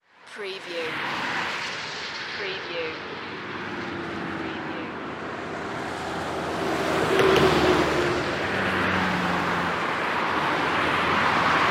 Motorway or Highway Traffic

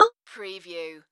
Multimedia Percussion

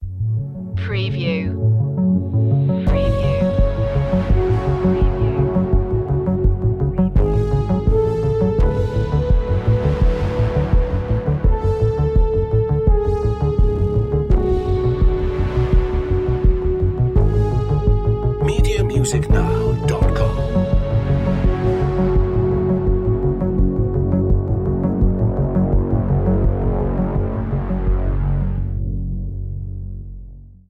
bizarre stuff

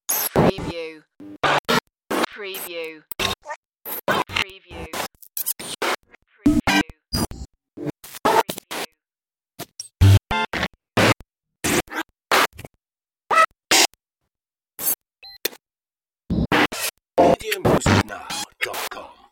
chaos transmission

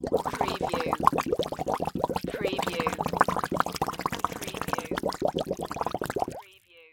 Bubbles Submerge; sound-effects-bible

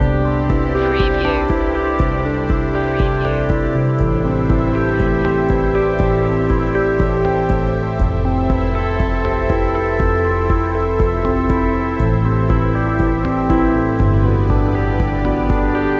inspirational achievements loop

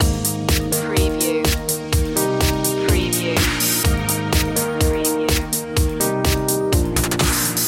dance train loop

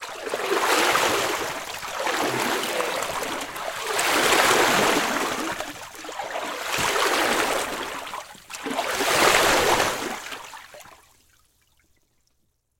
Splash Wade Pool

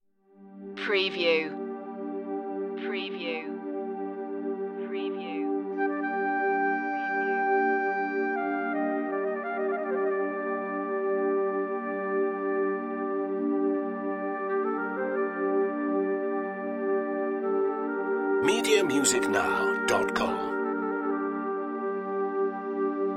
distance no cymbals

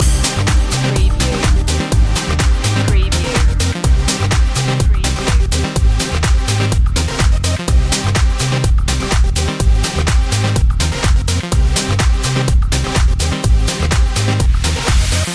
pulse motion loop

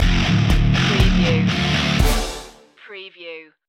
pump action stinger

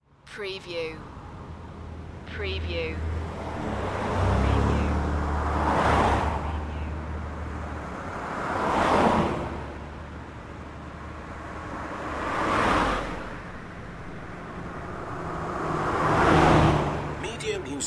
Highway Traffic 35mph

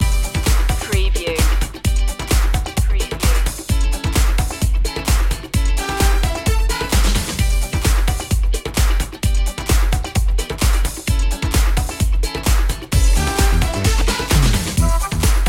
galaxy trumpet

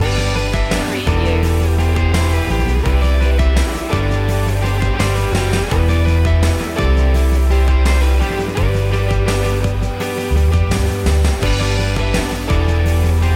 pop anthemic song main loop

